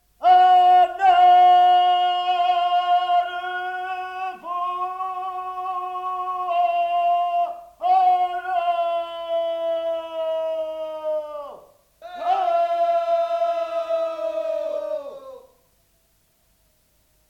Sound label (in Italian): voci per calare la palamitara